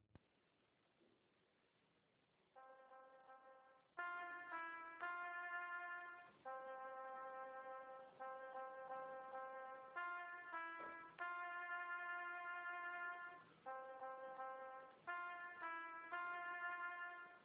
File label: piano